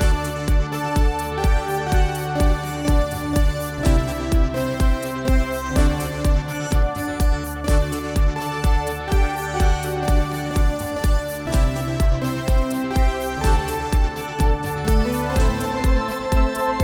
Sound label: Blast Off, sci fi